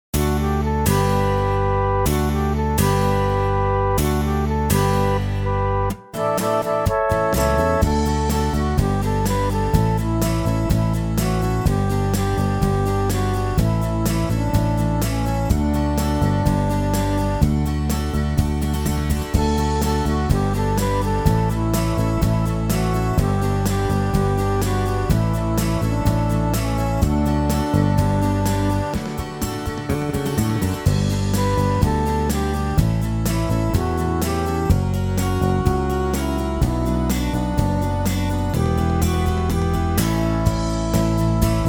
Glory to God in the highest including refrain BACKING; Mass of the Healing Lord; Glory to God in the highest - MISSAL VERSION 2